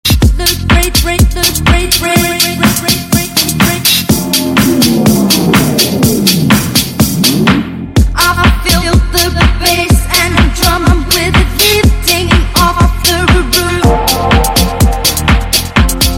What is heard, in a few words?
Feel the Bass